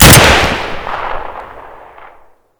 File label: ventil shoot, weapon; metrocraft